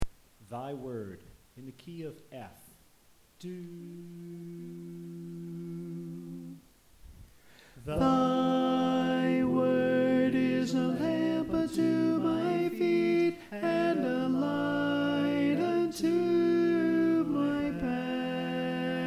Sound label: Alto